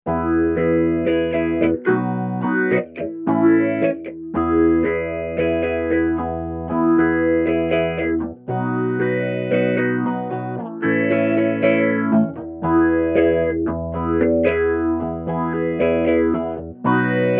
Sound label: phozer wah; max. Resonance